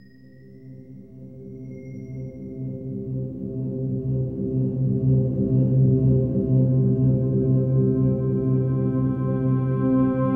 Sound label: TONA CHORD, ATHMOSPHERE